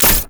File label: rumble basic attack